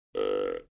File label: Deer Grunt